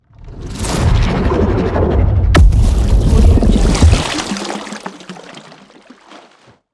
water explosive water hit; magelem; soundmorph

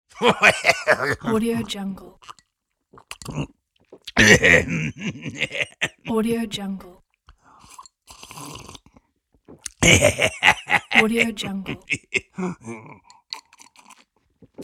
pirate laughing drinking

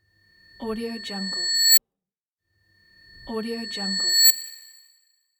reverse ring

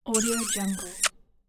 kiss

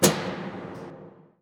mechanism breaker light switch on small distant; soundmorph

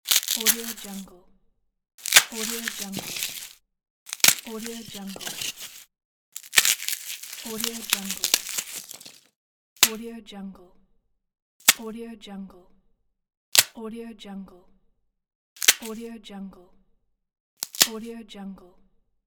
break down

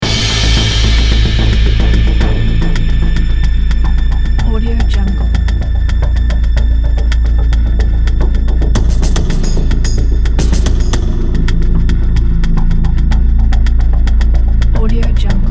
dark urban chase movie sequence; soniccube

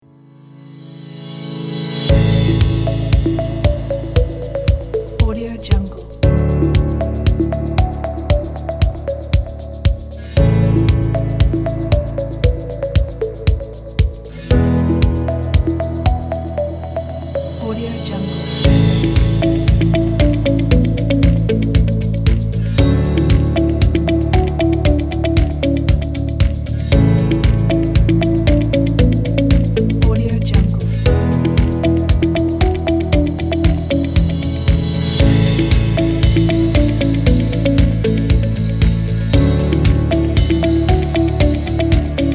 medical music pack

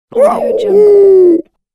baby monster disappointed sad

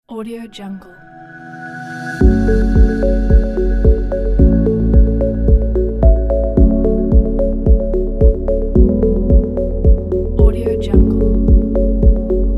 minimal relax ambient; audiocustom

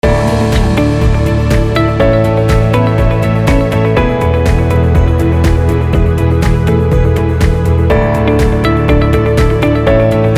the corporate loop; stereo-color

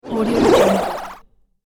disappearing flutter

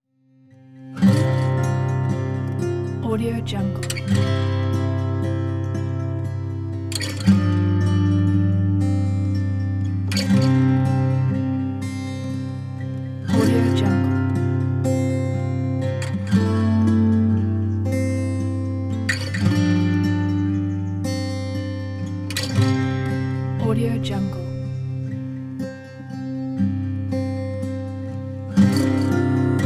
acoustic daydream; justinprins

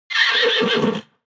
horse neigh agitated short; animal; smartsoundfx

